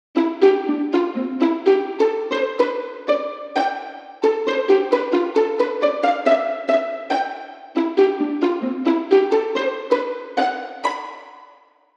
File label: Pizzicati Polka; Delibes